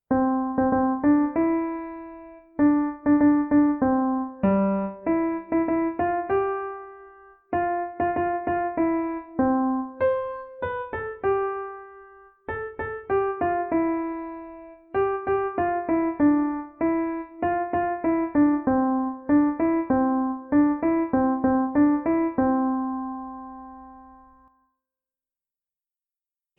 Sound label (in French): piano et chant l'araignee